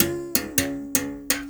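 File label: BS BERIM GRV, BERIMBAU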